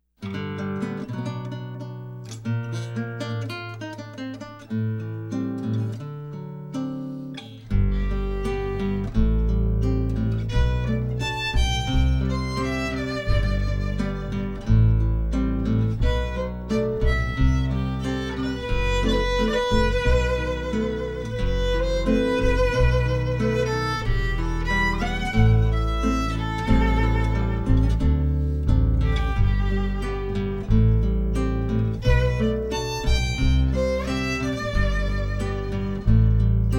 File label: violin guitar cello; Violin, Guitar and Cello Trio